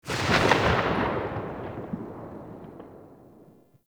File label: Scaldra Pistol Tail